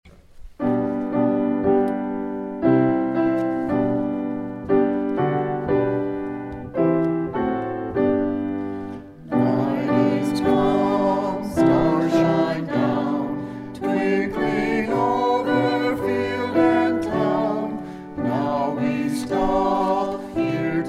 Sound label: choir